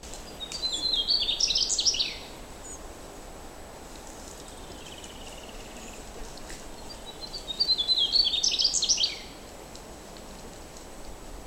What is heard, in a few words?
M mugimaki song